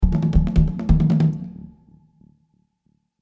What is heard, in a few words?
attack loop Outro; Aloy; Horizon Zero Dawn; PS4